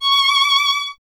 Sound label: STR VLN, Vln Detache; Miroslav Vitous